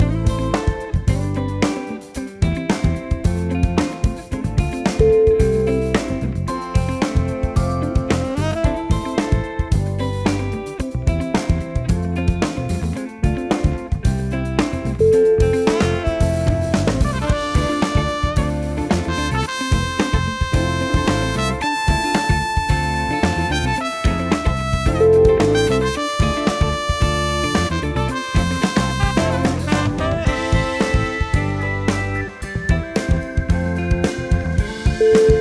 late night jazz

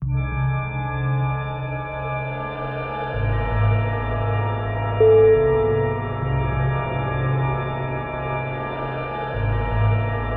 fear organ horror

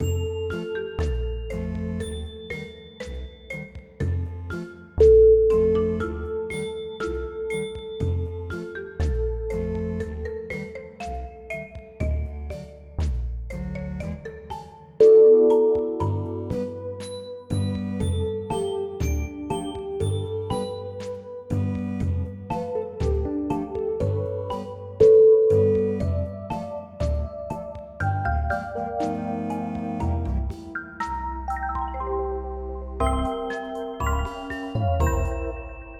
funny loop

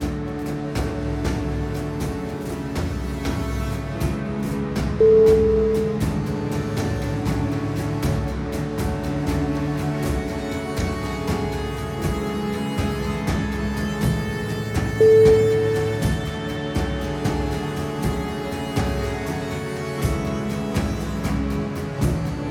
heroic epic journey

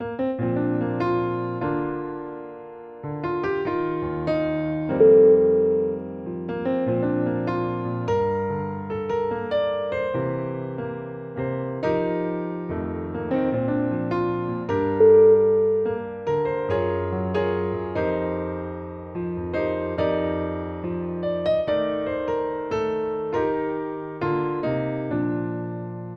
positive background piano

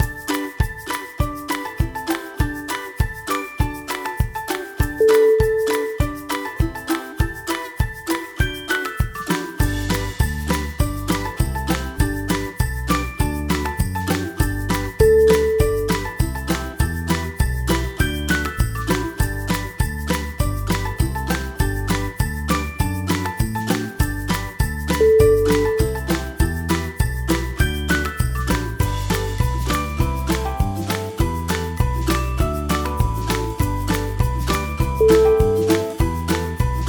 cheerful background

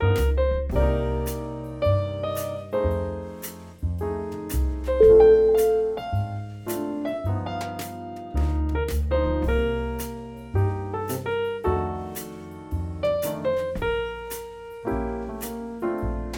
soft jazz ballad

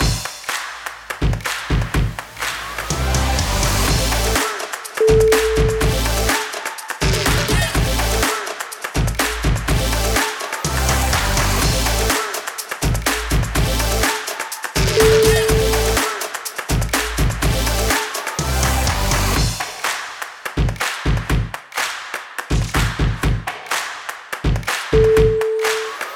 bamboo percussion

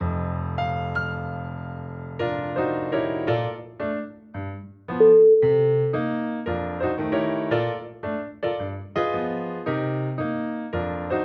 clown piano

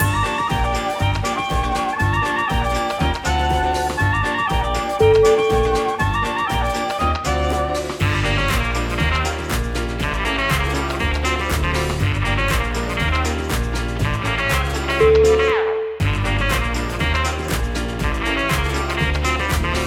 times square swing

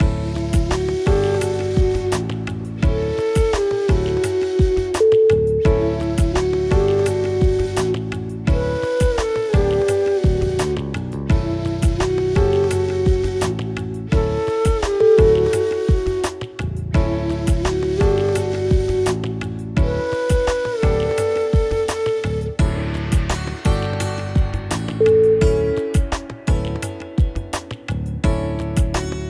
indian chillout

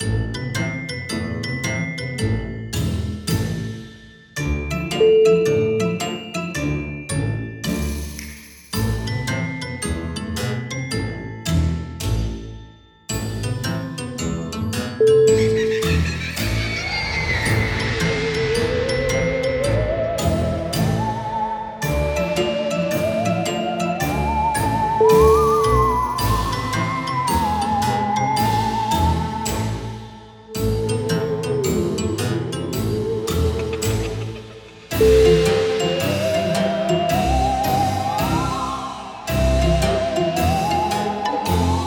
sneaky halloween witch

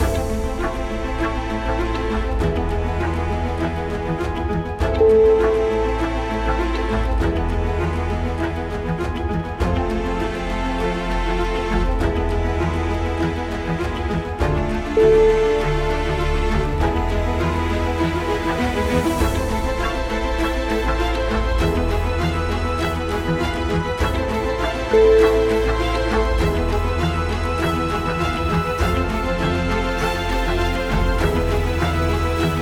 disturbing cinematic violins

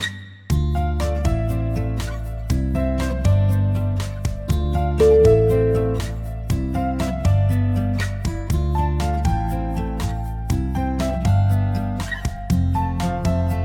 sciences infomercial

